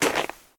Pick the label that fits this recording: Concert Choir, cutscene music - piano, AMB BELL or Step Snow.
Step Snow